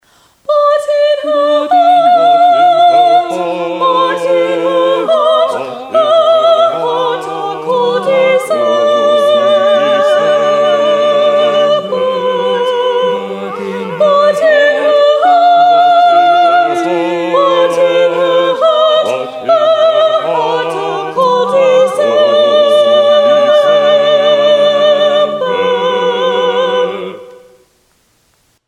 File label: soprano predominant